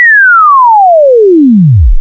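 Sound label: exponential decay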